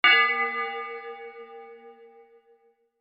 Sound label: bell